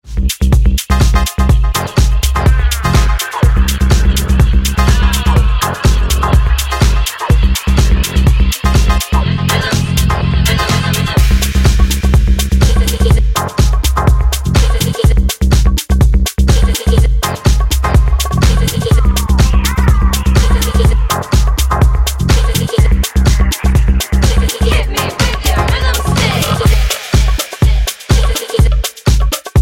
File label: Dub Loop